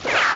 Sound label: Ricochet; BZFlag; Action